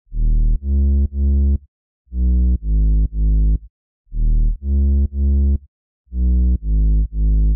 Bass, Dancefloor Pop; Remixlive

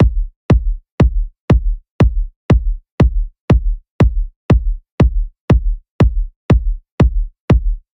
Kick, Dancefloor Pop; Remixlive

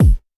Kick Hit, Dancefloor Pop; Remixlive

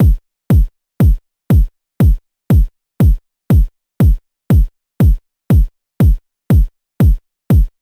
Kick, Dancefloor Pop; Remixlive

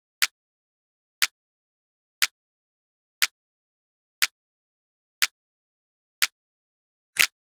Clap, Dancefloor Pop; Remixlive

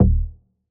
Bass Hit, Dancefloor Pop; Remixlive

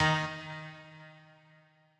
Chord Hit; Remixlive; Dancefloor Pop

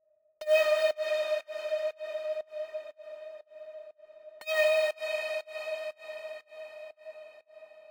Adds Glittering; Remixlive; Dancefloor Pop

